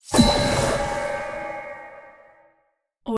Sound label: fantasy skill target weakness; esmteam